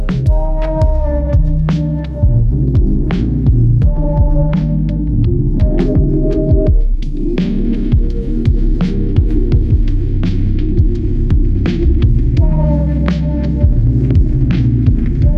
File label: ai cry lofi